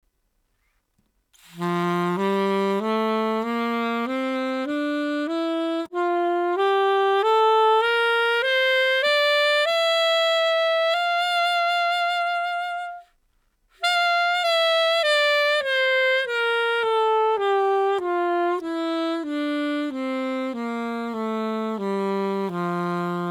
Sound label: jazz, mouthpiece; tramontin black white mouthpiece